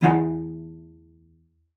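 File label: cello spiccato E2, cello; Xsample VOL-5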